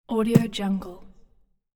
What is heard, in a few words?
thump; premiumstocksfx